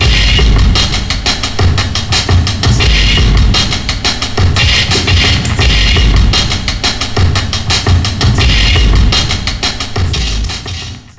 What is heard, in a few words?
Ditty; NBA ShootOut 2002; PS1